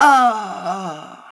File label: DYING; BattleSNDS